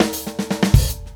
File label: kick